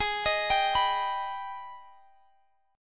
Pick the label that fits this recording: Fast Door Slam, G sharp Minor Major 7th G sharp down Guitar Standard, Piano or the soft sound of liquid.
G sharp Minor Major 7th G sharp down Guitar Standard